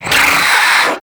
Swarm Vocal, Enemies

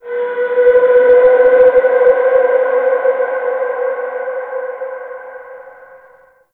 Landscape Ambient Texture

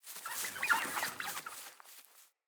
Sit Spawn Beaver Run By; Dendria

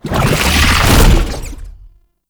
Latch Start; Roathe Summon Gulphagor; Warframe; Tau

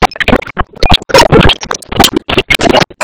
Pager Ringtone; Warframe; Entrati Labs

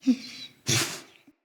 Wolf Cub Standing Idle Grunt, Animals; Vocals; Orengal Wolf Cub

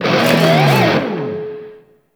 Shred Cast 3D; Warframe; Temple

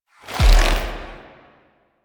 Drifter Pull; gameplay; Warframe; NineteenNinetyNine; Stage12PartyOfALifetime

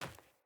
Sandals Run Dirt, Footsteps

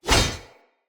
Whoosh, Swords; Weapons; Mockery; Mockery Brazard

